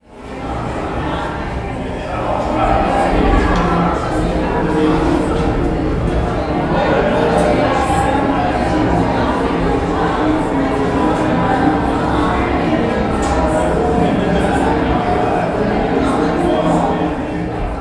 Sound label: Walla